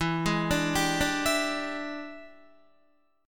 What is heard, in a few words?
E 7th Sharp 9th E down Guitar 4F 4A 5C 5G 5C 6E; arpeggiated; chord